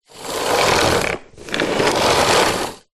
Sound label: household vacuum cleaner roll wood floor power off; zapsplat